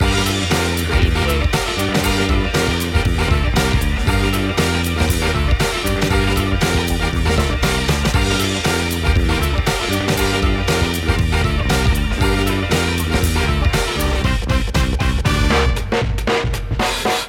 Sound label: funky bag loop